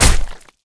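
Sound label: Damage Bow Skin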